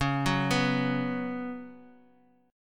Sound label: Db 7th Suspended 4th Db down Bass Standard; JGuitar